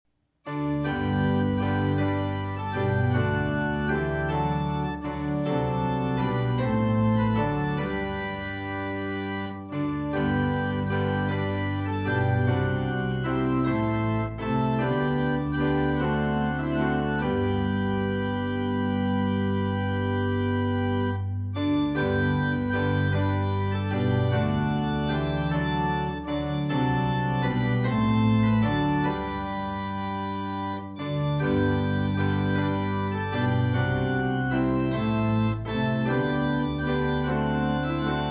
SDA HYMNAL PRAISE AND WORSHIP SONGS INSTRUMENTAL TUNE